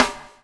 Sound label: BUBL; Spectrasonics - Backbeat